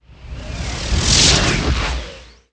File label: jet; Sports Illustrated for Kids - Awesome Athletes!